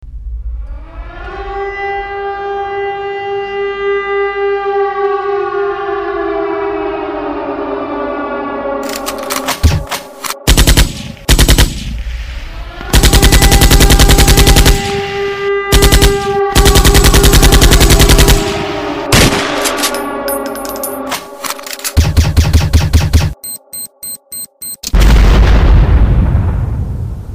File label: war siren; ringtones